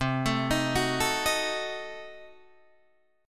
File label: C Diminished 7th down Guitar Open D